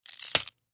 Snap Celery Creak, kitchens

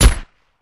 Gun Shot Silencer, silenced singles

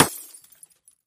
Fluorescent Bulb Cra, housewares

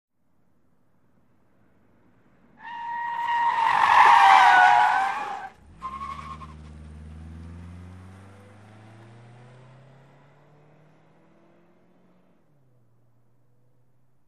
Sedan 180 Skid Mix

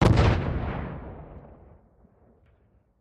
1st Car Blow Up 4 Pipe

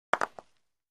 Croquet One Ball Hit

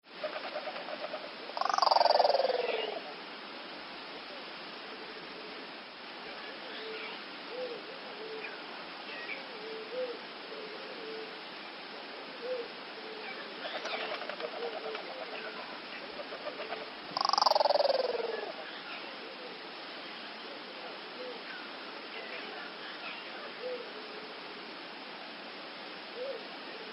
Mourning Dove Cape Tu, doves african

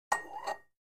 Free Weights Exerci

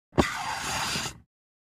Pneumatic Door Mecha

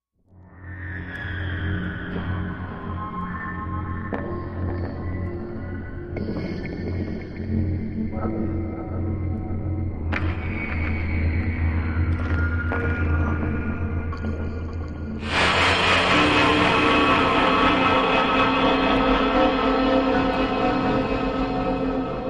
Hollow Metallic Haunted Movement, Shimmer Wash; Beneath The Church

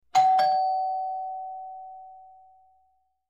Good Ding Dong Door Be, doorbell

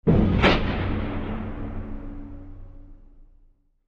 Metal Eerie Hit Echo

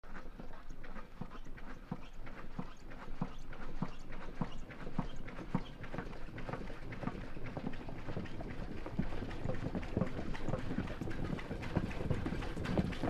Car Tire Flat Slow St

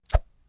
Baseball Arm Cloth W; baseball fx & games

